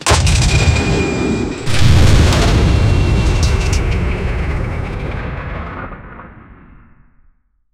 rpg rocket round being lo, launcher

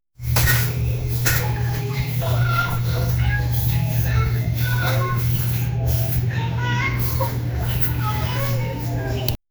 very low ambient sound of, studio

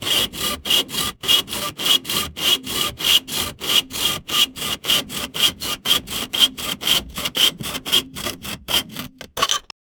sawing wood; woodworking

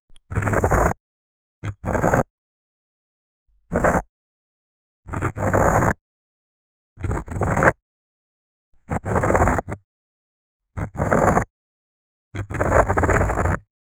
growl of the ink creature, monsters

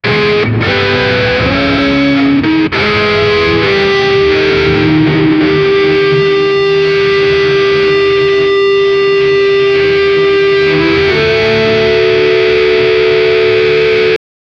guitar part, gothic

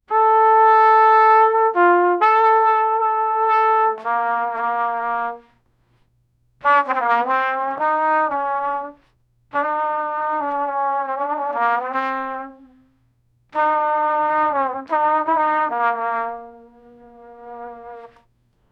dark cartoon musical trum

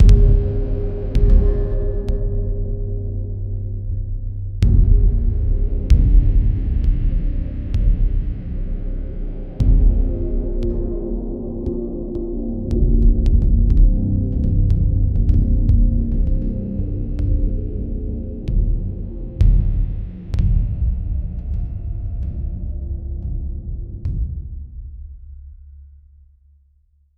low ambient tension with, clinks

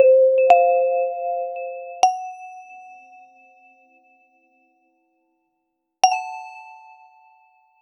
player completes, success

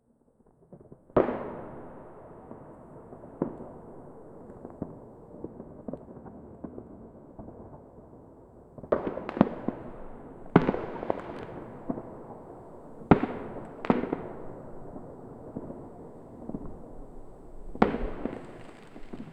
the deaf twists of artill, explosions

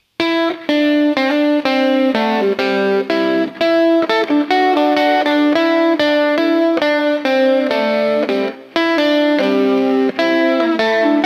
guitar in the style of, indie

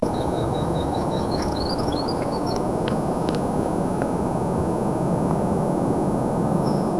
light ambient, park

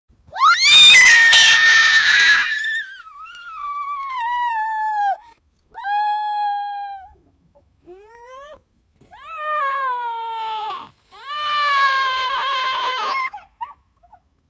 mermaid hips bump into th, falling

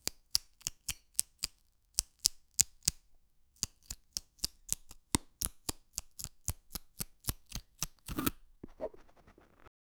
knife cutting through jel

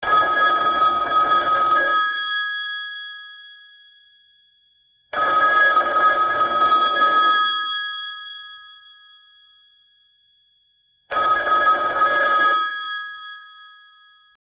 classic phone ringing; communication

